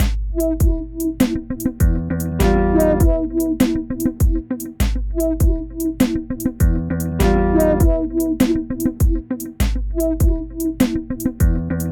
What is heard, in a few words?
Kick